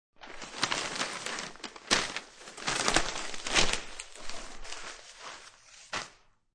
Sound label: Nwspr Rstl, Newspaper rustles when handled; Newspaper Rustling 1; Objects